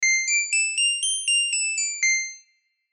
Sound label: ring mod